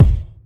bass drum single shot g key, Royality free kick drum sample tuned to the G note.